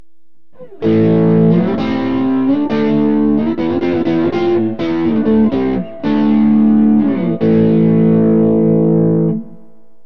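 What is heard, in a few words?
thotrail neck, guitar pickup; burny; fugly clips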